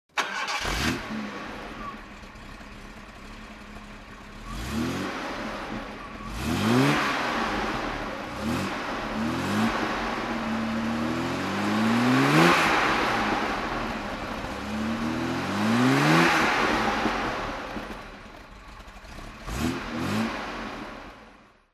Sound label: jaguar XJC 42 throttle; jaguar XJC 42 4aut